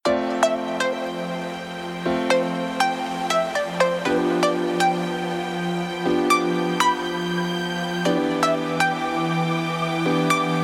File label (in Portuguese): House com vocal